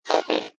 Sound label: Click Multiple Distortion Whoosh; Scifi Production Elements, Set 2